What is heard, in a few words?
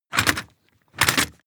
movement metal storage medium hard, box; objekte